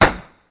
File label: dirty hh Trick CL, Dirty Hip Hop Kit; STYLE KITS (Hip Hop, Etc)